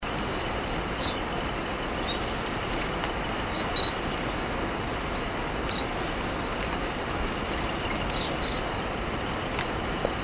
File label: Rhombophryne minuta